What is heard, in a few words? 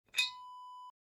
glass cork open